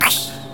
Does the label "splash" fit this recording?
Yes